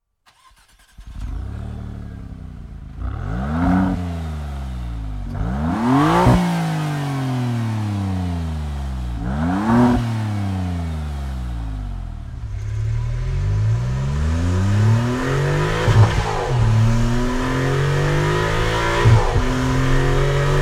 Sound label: ringtone; maserati; mc20